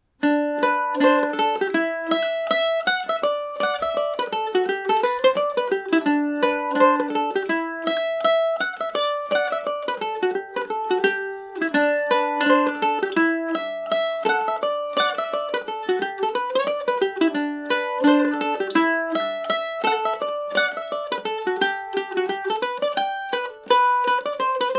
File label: mcquillans hill, mandolin; recorded January 2021 on my G&O #34 using a Zoom H1n digital recorder